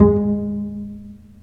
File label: Cb Pizzicato, String; Cb Pizz 1; Roland - String Master Series